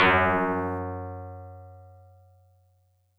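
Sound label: Tubular Bells DX100 F sharp 4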